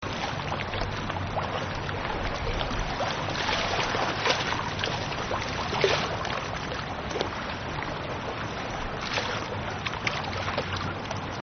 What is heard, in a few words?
lapping